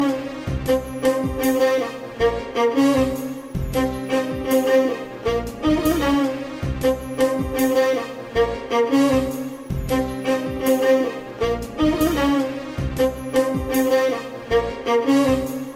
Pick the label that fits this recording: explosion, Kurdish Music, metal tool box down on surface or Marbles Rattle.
Kurdish Music